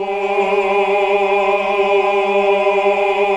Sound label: AAH UNI